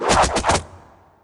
Human Hunter Fall

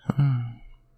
sleepy snore

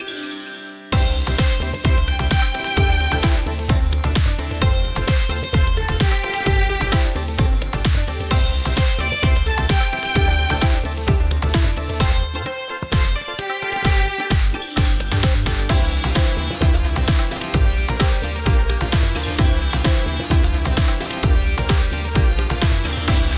B Menu Event

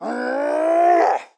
Shield Scream; Pecheneg

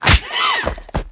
Enemy Strigger Damaged

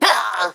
Pecheneg Rogue Damage

